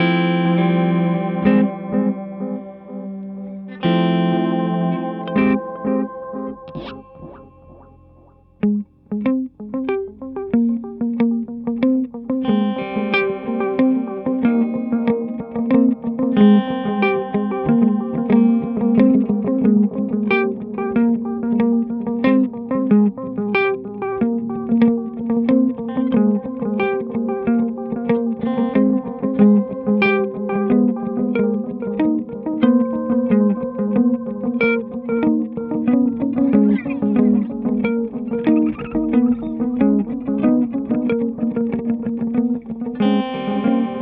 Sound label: Black Hole Symmetry Delay